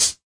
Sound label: hat; Hats